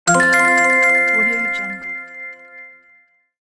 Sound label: bonus coin alert; game